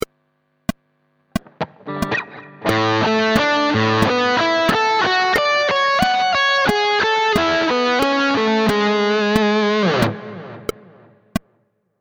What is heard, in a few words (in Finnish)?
Amaj13 patteri